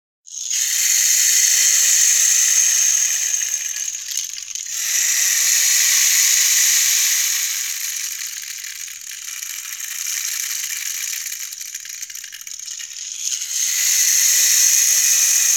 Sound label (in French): baton de pluie; vie de l'ecole